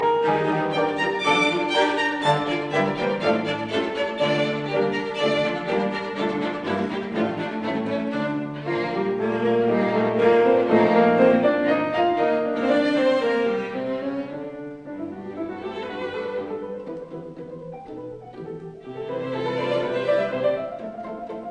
Piano Quintet in E flat major Allegro ma non troppo; Budapest Quartet